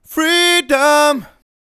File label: Freedom, Male Vocals